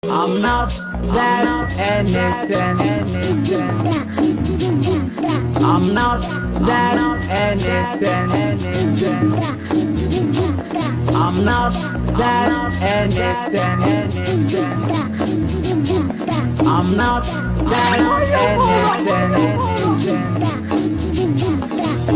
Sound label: VOCALOID Slowed reverb